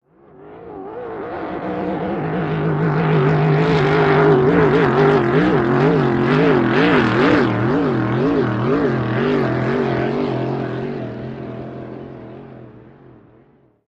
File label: Hydroplane By Light